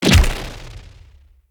Staff Proj Impact Player; Weapons; Enemy; Staves; Mnd Wazard Staff

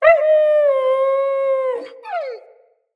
Wolf Cub Trapped Call Far; Orengal Wolf Cub; Animals

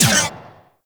Venus Cart Turret Laser Shot; Turrets

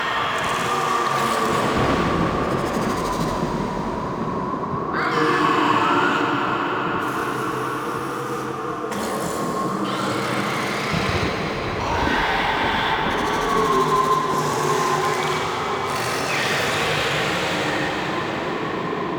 Invasion Bed Loop, Ambience; Warframe; Cetus

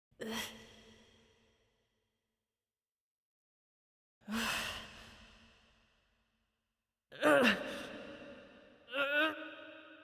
Arena Wake Up Vocalizations Female; Envoy; Cinematics; Bromius; Bromius Quest Arena Wake Up

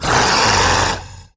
Pain, Barks; Warframe; MiTW

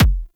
Weapon Punch Rifle; Warframe; Weapons; Rifles

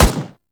Turret First Shot; weapons; Lasrian APC; Warframe

